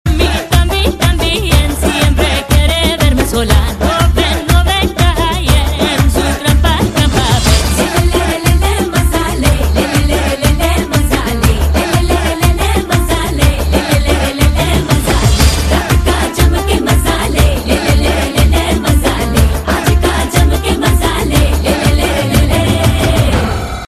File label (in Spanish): bollywood mezcla castellano tono de llamada